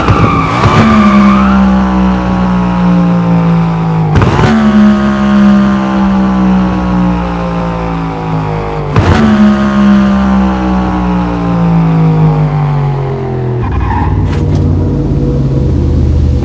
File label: Throttle off fast, vehicles Caterham; garrysmod